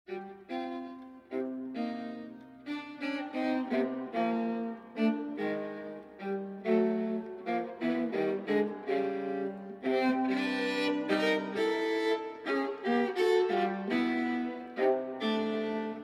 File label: Sonata For Viola Solo VI. Chaconne Chromatique